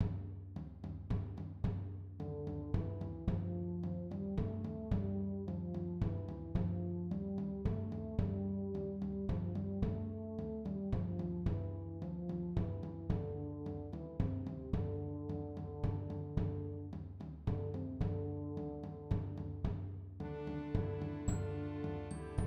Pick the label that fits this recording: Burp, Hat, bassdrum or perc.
perc